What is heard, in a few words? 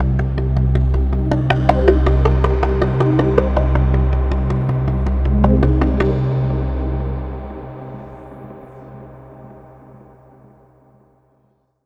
AMBNT 160; eJay; Ambient